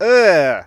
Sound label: disgust